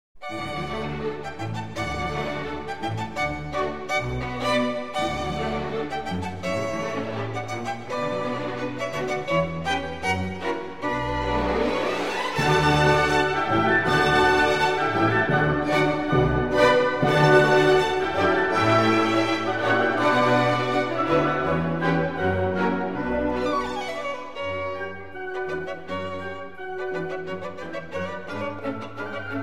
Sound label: Violin Concerto in D Major Allegro giocoso, ma non troppo vivace; Op. 77 III.